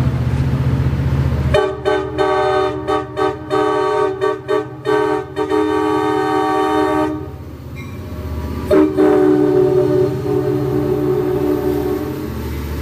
plays a holiday salute, K5LA, horn; a few days before Christmas; Glen Head, December 2016; lirrhorns

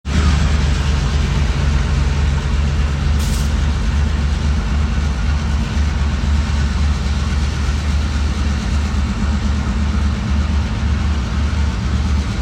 MP15AC EMD 645 prime mover stone train up hill; Lower Montauk; Morris Park

